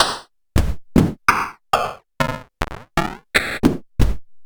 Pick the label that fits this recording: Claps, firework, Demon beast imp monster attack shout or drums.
Claps